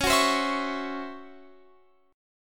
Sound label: C sharp Minor Major 7th Double Flat 5th, Guitar, Open F; C#mM7bb5; strummed; x,20,18,19,19,20